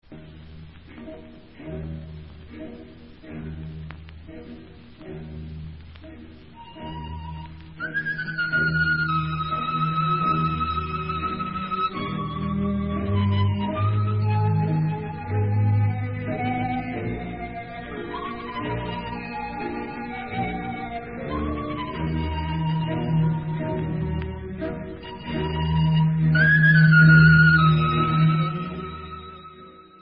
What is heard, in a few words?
Rumanian Medley, Instrumental; Arieh Vaks